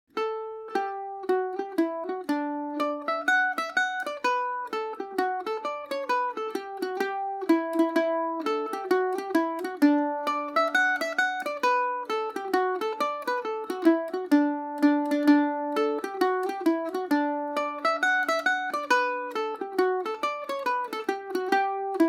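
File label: hatters hornpipe D major, irish mandolin; Paris Swing Macaferri mandolin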